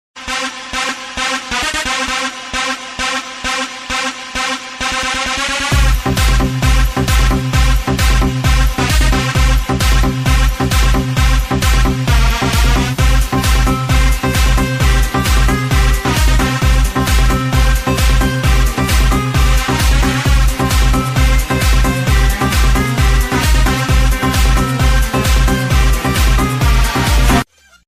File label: bgm trap ringtone